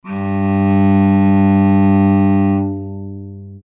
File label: G2, cello